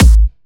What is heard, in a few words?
Kicks; Vengeance Samples